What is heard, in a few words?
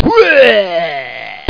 barf